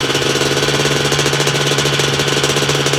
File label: moto HIGH; vehicles